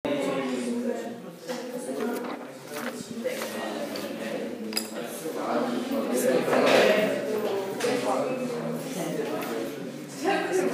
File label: piano